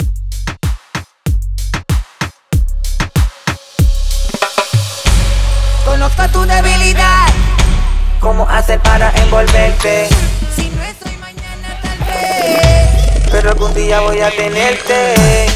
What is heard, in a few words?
Acapella